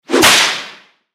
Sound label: Whip Crack Bullwhip Impact Snap Reverb; Whoosh; Whipping; Warfare; Blastwave